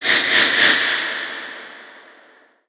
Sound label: door close lock